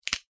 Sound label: horror bone finger snap, Finger/Small Bone Break; Breaking; Objects; McKinney Sound